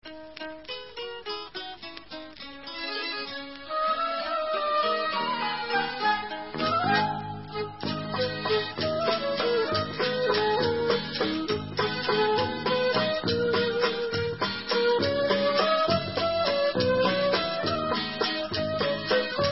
Vals, Waltz, Folk Instrumental

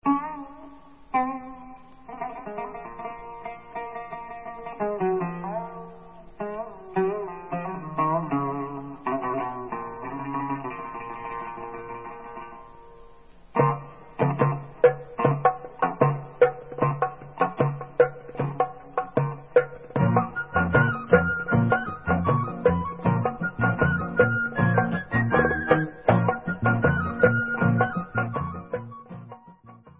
Debka Ud Instrumental; Bentzi Tiram 1972

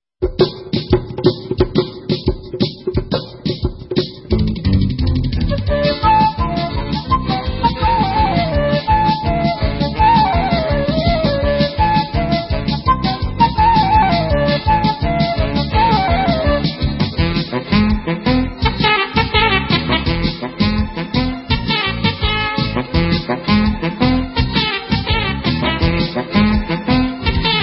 Hora Instrumental

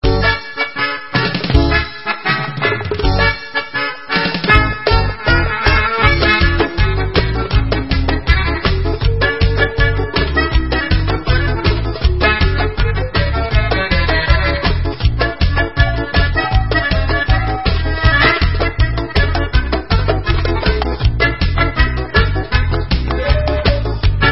Instrumental

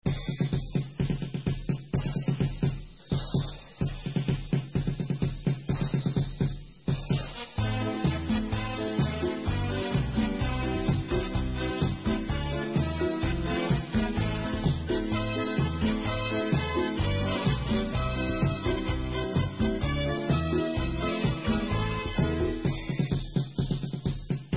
Instrumental

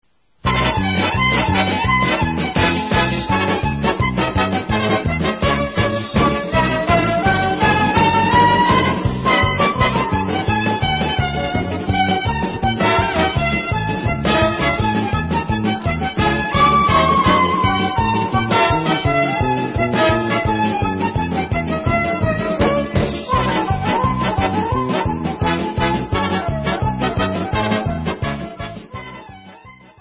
Instrumental

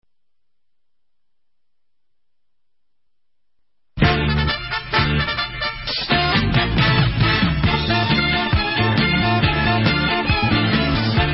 Instrumental

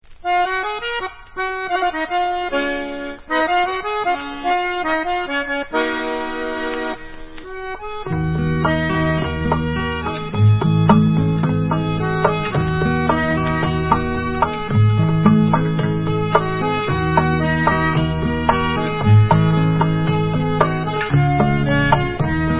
Instrumental

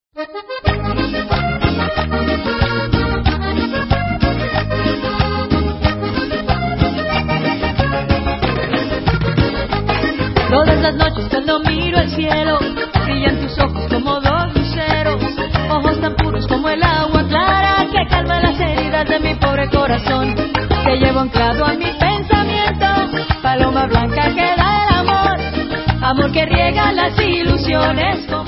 Cumbia

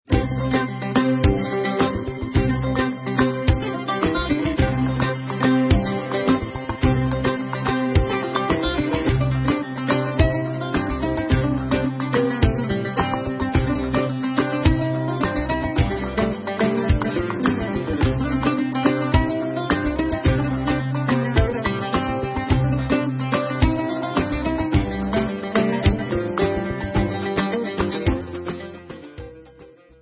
Debka, Instrumental

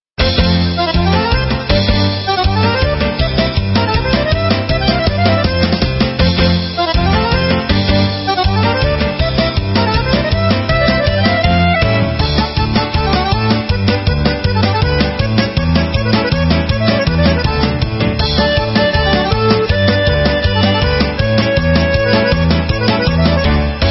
Instrumental

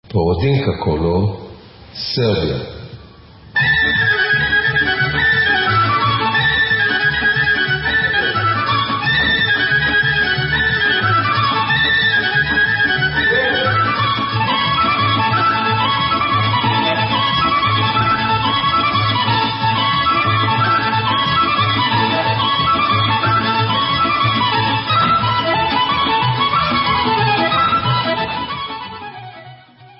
Kolo, Serbian Instrumental; Porodinka

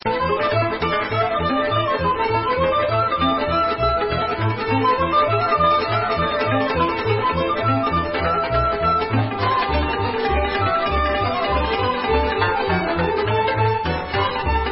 Hora Nuzi, Rumanian, Instrumental

